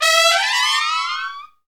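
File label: trumpet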